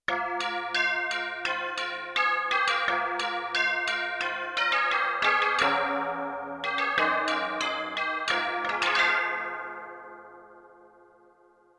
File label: Tubular Bell Chorus, Yamaha TX16W